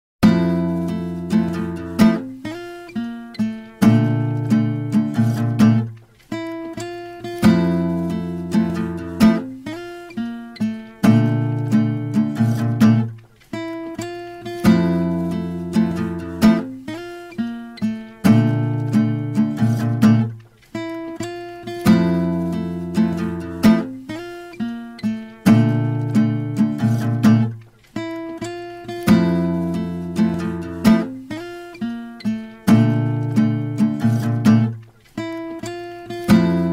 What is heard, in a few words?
Instrumental